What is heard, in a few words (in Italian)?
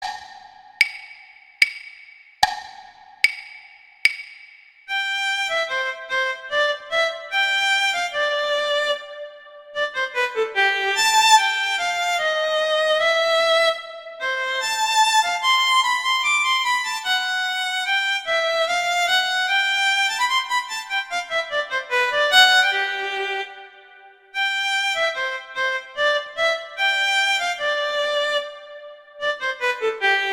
Amoroso Guitar; Paganini; Sonatas Op1 Vl Gu; Sonata No 1